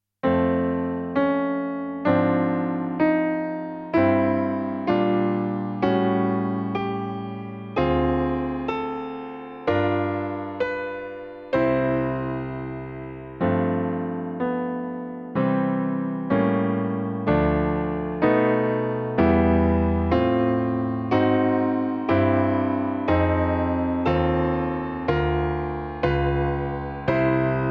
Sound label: karaoke